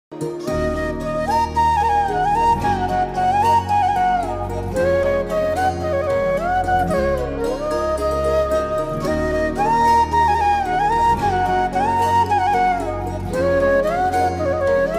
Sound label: Flute Ringtone